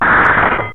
Drawbridge

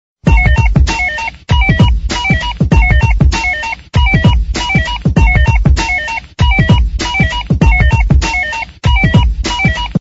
cellular hiphop

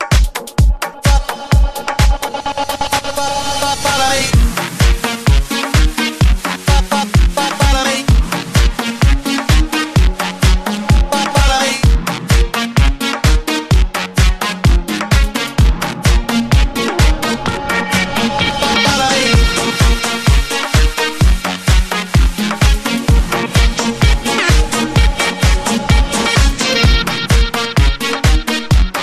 8bit

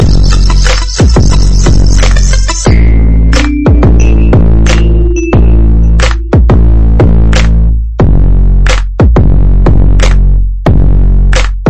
nice hiphop new, ringtones

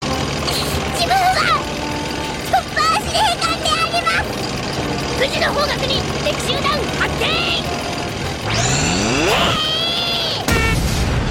chopper

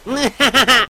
nuhahaha

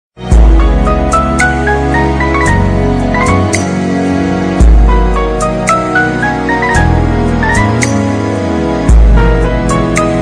music

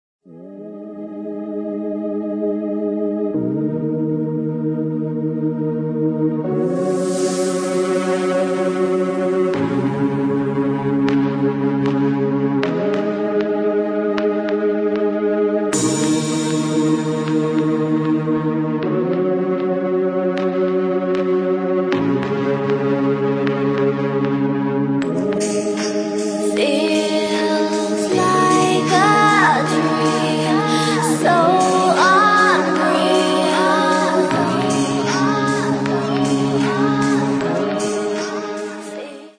dubstep

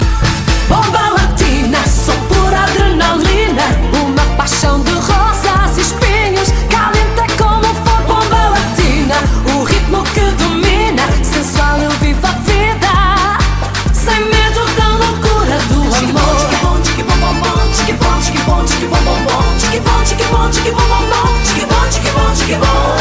Bomba Latin

